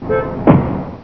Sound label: Car